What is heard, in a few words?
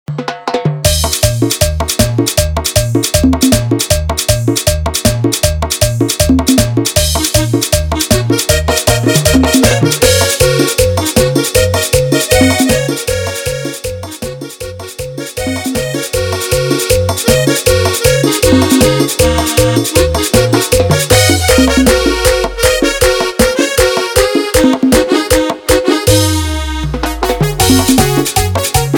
Instrumental BPM 157